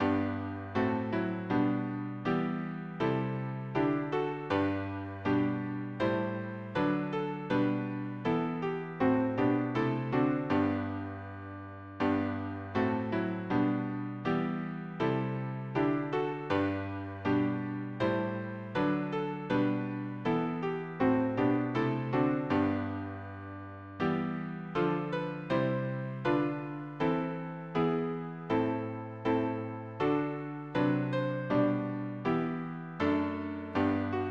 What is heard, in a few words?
harmony piano